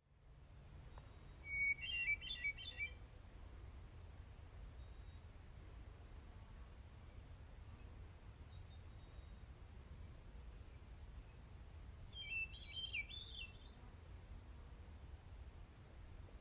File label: Hermit Thrush